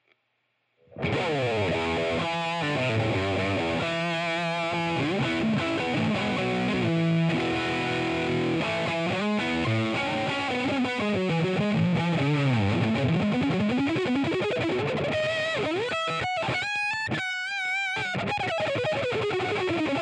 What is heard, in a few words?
Line 6 Catalyst Hi Gain DI Out